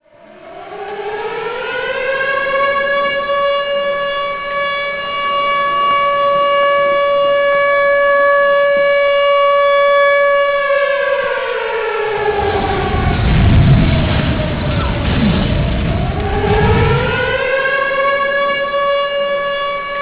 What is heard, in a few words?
mist siren